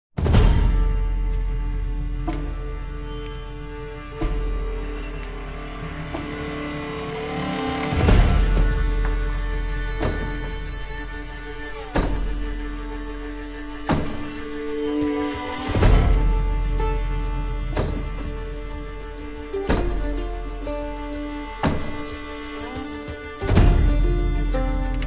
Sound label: music; lol; bilgewater; all random; butchers bridge